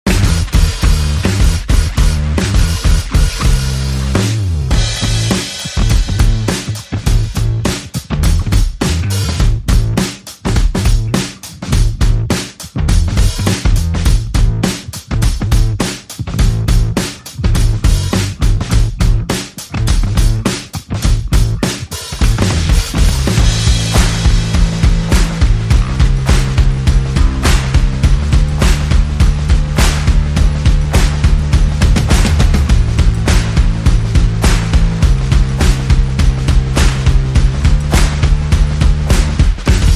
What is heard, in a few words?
Rising Rebel, Percussion Bass and Effects